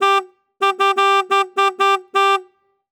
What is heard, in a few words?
motorcycle, Fat Boy, horn, short, random, eight, 5ft, distant reflections; 100year; Anarchy; Eiravaein Sound